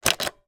household vacuum cleaner upright on off switch press; sound effects one